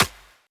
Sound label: Claps & Snares